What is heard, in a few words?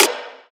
trap snare one shot F sharp key, Royality free acoustic snare sample tuned to the F# note